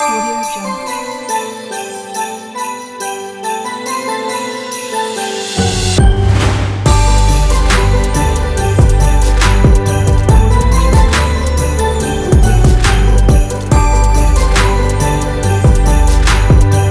deck the halls kit; neonfoxx

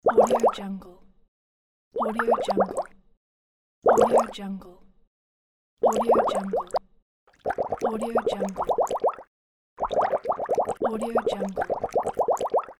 bubbles

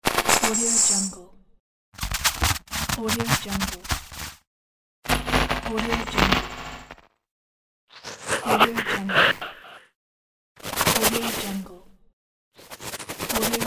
glitch pack

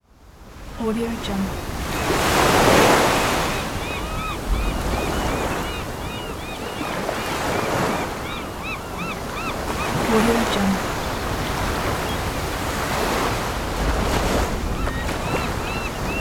beach

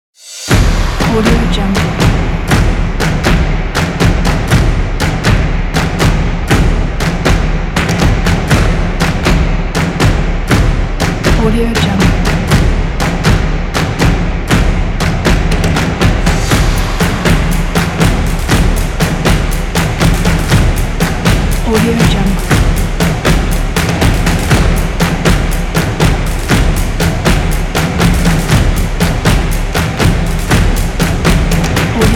percussion

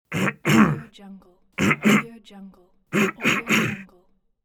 cleaning throat

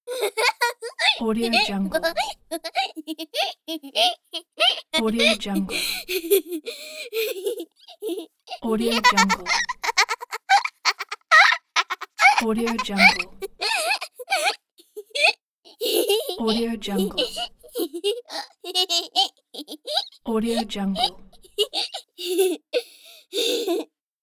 the child laughing

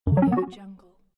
wrong

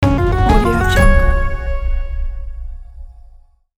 modern audio logo; monoorchestra

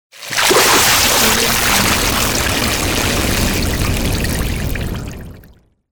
monster dinosaur water emerge

